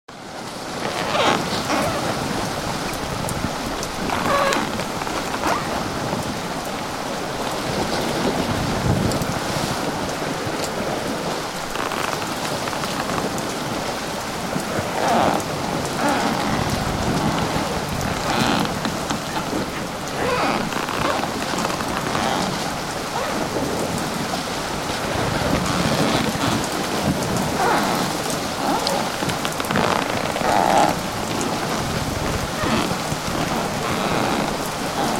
Wooden Ship Deck, Atmospheric sound on deck of a wooden ship at sea creaking on the waves; Atmospheric sound on deck of a wooden ship at sea creaking on the waves with rain.; Boats